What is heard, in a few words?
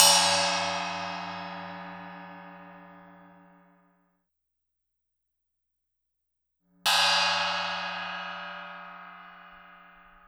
Gong Hits; Sonic Foundry (Sony Creative Software); Continental Drift Aftershocks